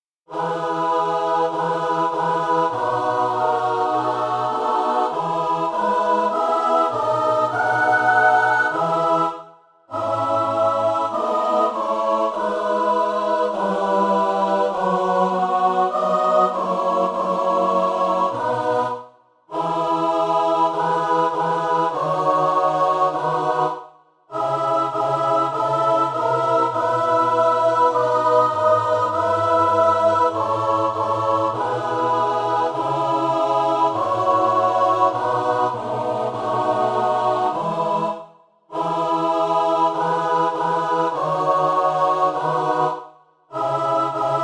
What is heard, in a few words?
midi psalm; moniuszko; do boga psalm 137